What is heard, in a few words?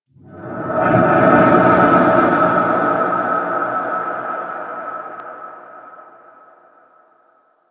Random, Ambience Interior; Warframe; Orokin